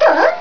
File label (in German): QUIETSCH